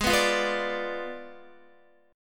Ab Diminished 7th Ab, Guitar, 4F, 4A, 5C, 5G, 5C, 6E; Abdim7 strummed; JGuitar